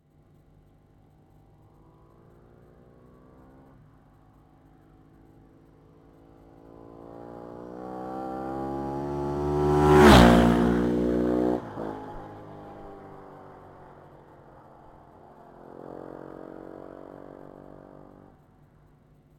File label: Ducati 996R, bike